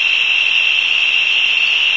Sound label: cicada loop